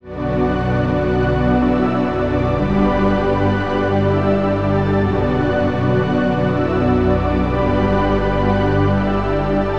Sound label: hot sunset pad synth g; instruments; loops